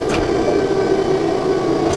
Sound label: Turret Activate; Defiance